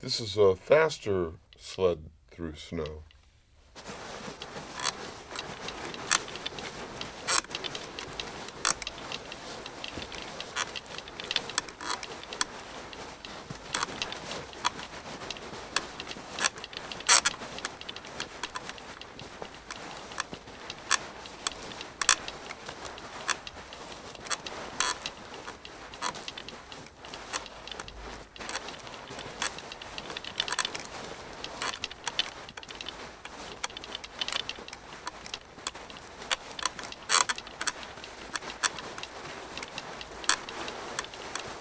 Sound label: Sled Being Dragged S; bed movement